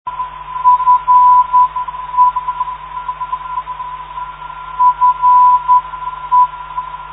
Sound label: 423khz, ndb